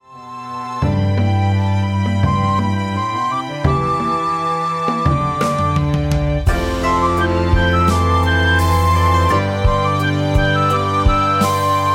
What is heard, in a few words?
Blowing a tune; MIUI14